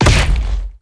skill bear hit

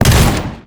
shotgunx

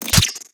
imp chain whip

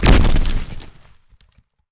imp stone rush

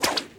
atk chain whip

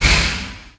e skill crowns flash

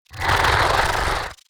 fish howl

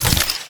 atk benumb set

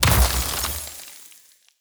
ice prick end

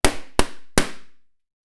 balloon burst

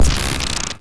knife throw

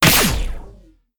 switchblade

